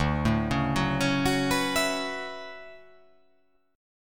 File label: D Major 13th D down